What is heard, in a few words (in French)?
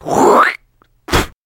crachat